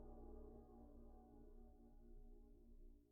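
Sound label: suspended cymbal roll pp release; Idiophones; Struck Idiophones; Suspended Cymbal 2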